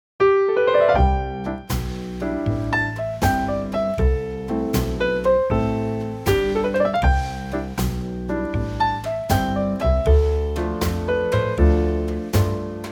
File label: C Trio